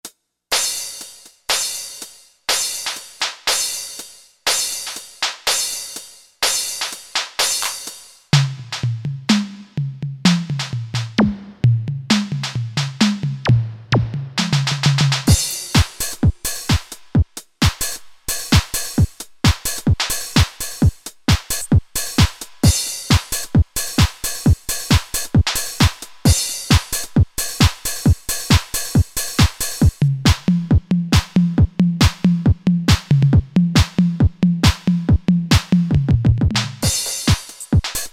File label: TECHNO, Roland SC-880 Rhythm Sets; Factory